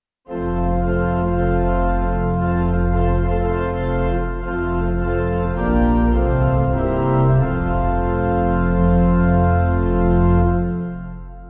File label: Victory In Jesus (pipe organ)